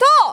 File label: kiai; MonsterHunter; Fight